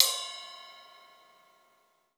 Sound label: CLUB RIDE; 3D Sound; 3D Drums